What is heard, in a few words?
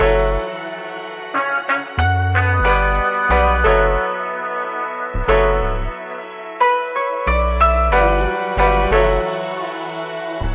keys and horns nineteen sixties; Loops; Phonky